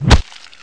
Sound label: claw strike, bear